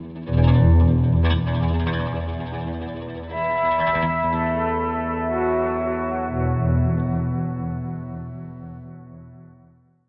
Sting; Assembly Required; Minecraft: Story Mode

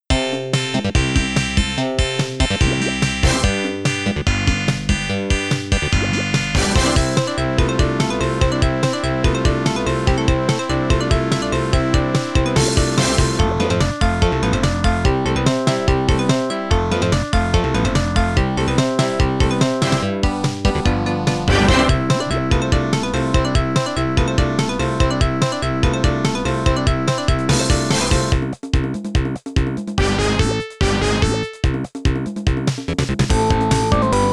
Stage BGM; Bang Bang Busters

